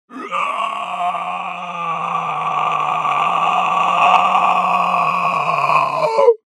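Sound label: sounds of zombie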